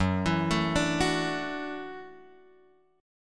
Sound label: F sharp Minor 7th Sharp 5th, Guitar Drop D; chordshape; down